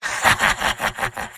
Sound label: engineer laugh evil; tf2; garrysmod; mvm